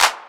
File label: Yamaha CS6, Drums